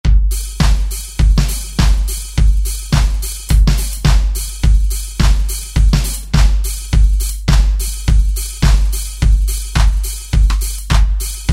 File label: Disco Kit, Alesis QS8; Factory Preset examples